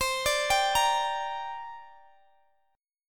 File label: C 7th Suspended 2nd, C, down, Guitar, Open D